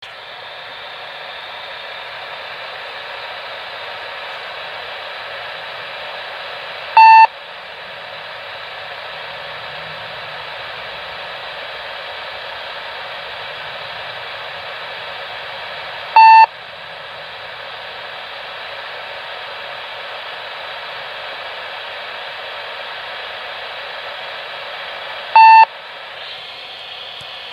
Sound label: Motorola FailSoft; Motorola Trunking Failsoft Tone; This is a sample of the sound that a Motorola trunk system will make if the site controller has failed